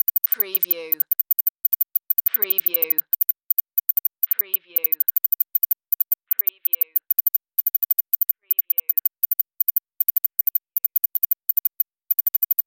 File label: Teletype Readout Beeps